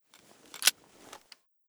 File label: m203 deactivate, weapons